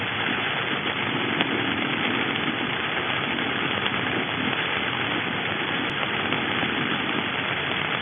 Lutjanus griseus Larvae Field Growl; Grey snapper

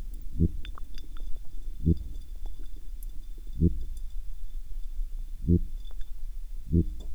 Serrasalmus marginatus Sound

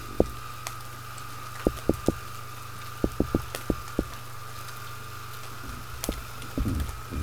Sebastes maliger Pulses; Quillback rockfish

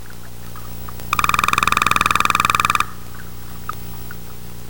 Ophidion marginatum Jackhammer Chorus; Soniferous Fishes of Massachusetts

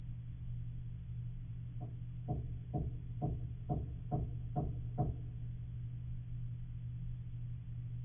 Lutjanus griseus Larvae Tank Knock; Grey snapper

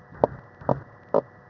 Argyrosomus regius 1-3 Pulses

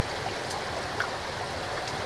Oncorhynchus kisutch Pulse

